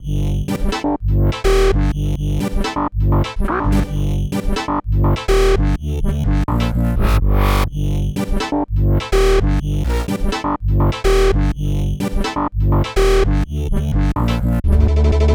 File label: 125 Explorations G Full Mix, Complex Electro Synths; Prime Loops